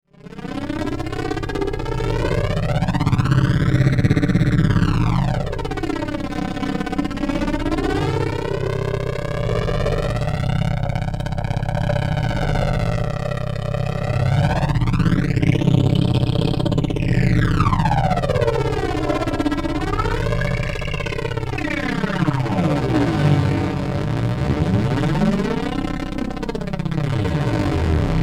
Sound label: Bed Fluctuating Filter Flanger; Bed Sound Effects